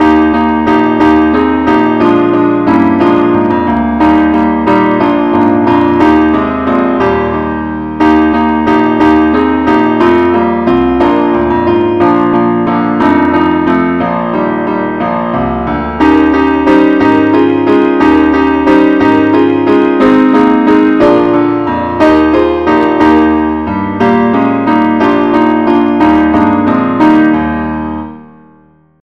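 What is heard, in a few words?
keyboard only key E flat; angelvoices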